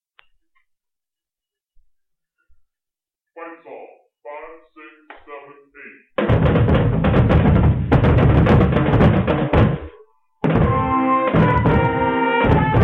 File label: slow version fight song